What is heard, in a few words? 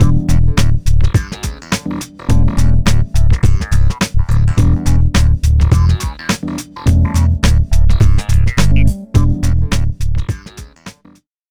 Mission Complete music; Grand Theft Auto III 2001; game soundtrack